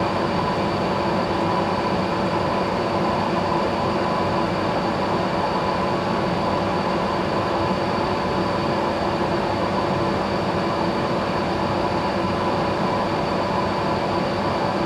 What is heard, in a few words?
atmos